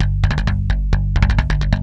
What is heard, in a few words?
Brush, Industrial, Nasty